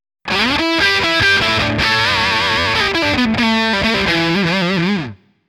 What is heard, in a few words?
Back Guitar; K-ON